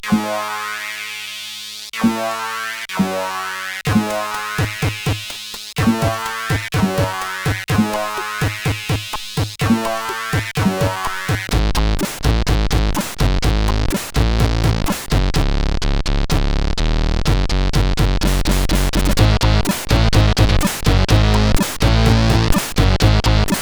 Hard-Soft, CSG8580R5

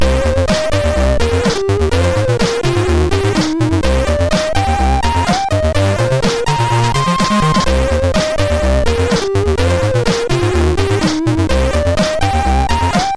Hollow plus, MOS6581R3; hvsc

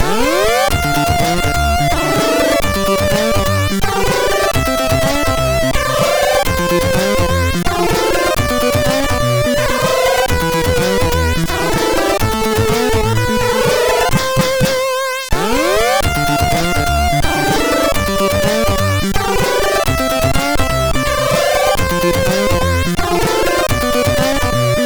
sid MOS6581; Blues Imam Ja; MOS6581R3

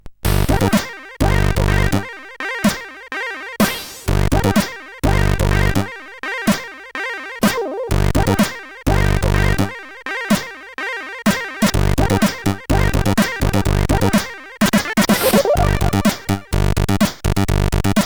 sid CSG8580R5; Kakao

